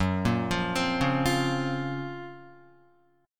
F sharp Major Sharp 11th F sharp, Guitar; arpeggiated; JGuitar

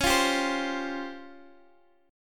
C sharp Major 7th Flat 5th, chord

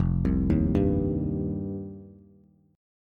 F 7th Suspended 2nd, Bass; F7sus2; arpeggiated; JGuitar

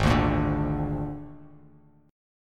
G sharp Suspended 2nd Flat 5th, Bass Standard; G#sus2b5; strummed; JGuitar

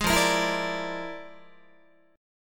F Minor Major 13th, Guitar; strummed; JGuitar

